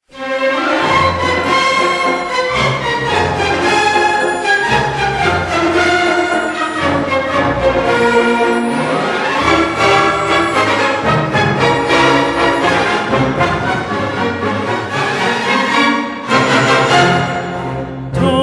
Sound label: Singing Master of Ceremonies